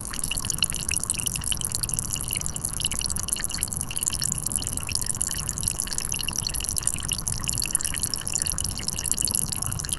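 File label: liquid flows soft pleasant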